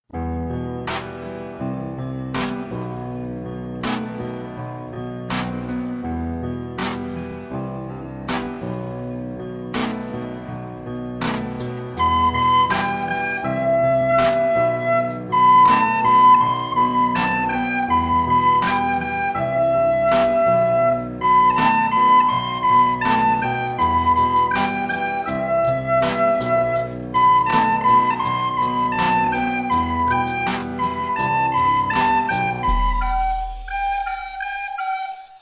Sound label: background track with recorder